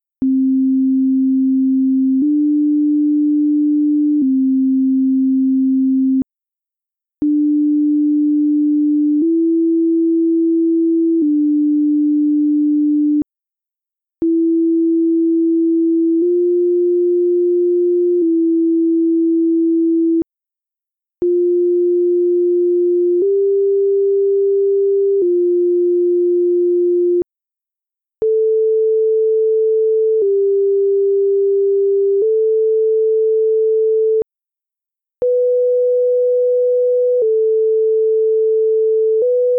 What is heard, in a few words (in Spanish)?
tonos